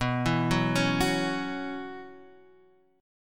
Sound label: B Suspended 2nd Suspended 4th, Guitar, Standard; Bsus2sus4 arpeggiated; JGuitar